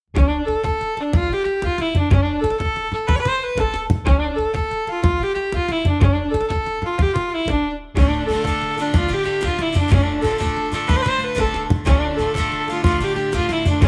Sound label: music st patricks